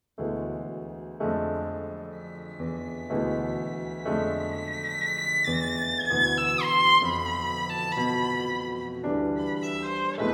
Violin Romanza

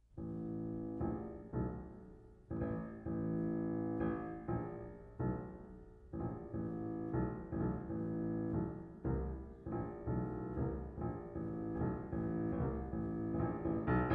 Piano Sonata

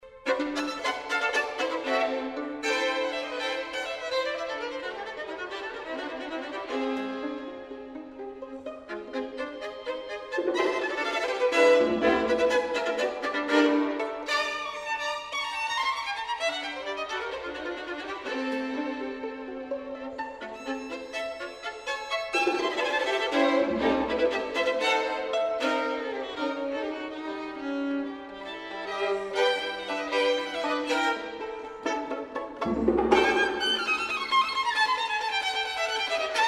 Violin, Concertos; Dutton

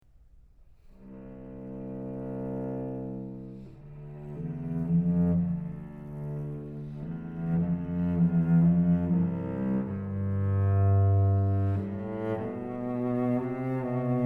Cello; Tecchlers Cello

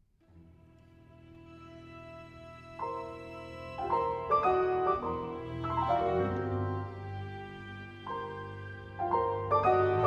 Piano Concerto

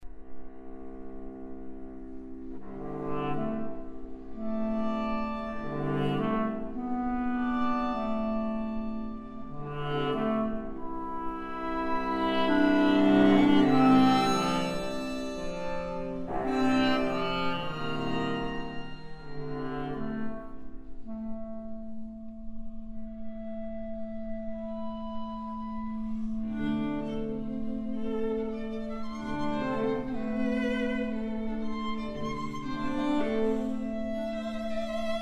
Clarinet Quartet

